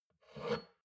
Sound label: shot glass grab table slide soft; berufe; soundpool